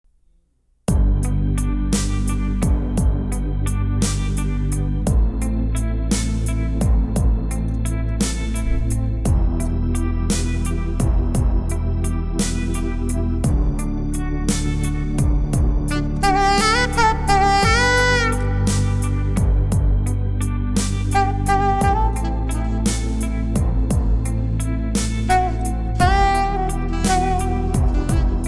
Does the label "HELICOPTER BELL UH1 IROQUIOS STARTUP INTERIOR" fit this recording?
No